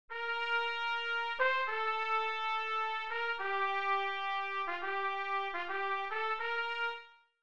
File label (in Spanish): Trompeta Bb